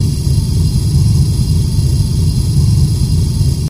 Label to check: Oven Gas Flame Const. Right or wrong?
Right